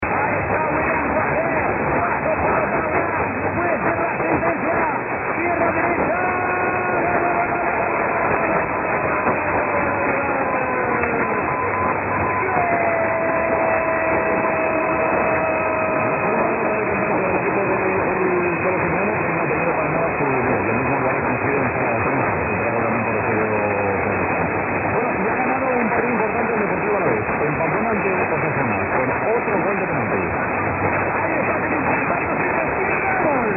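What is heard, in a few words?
SER Spain gooooaaallll, radio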